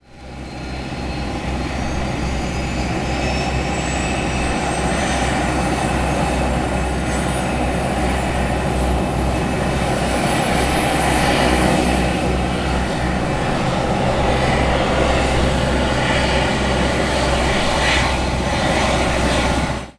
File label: helicopter starting interior; transportation